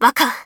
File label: combo break